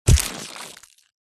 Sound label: horror gore impact thud bone break squelch